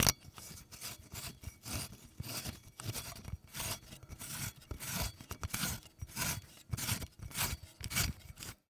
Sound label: Technology Electronic Lamp Light Bulb Screwing In Lamp